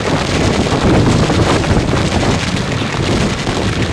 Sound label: roll awning, terrains; Tony Hawk's Project 8; XBOX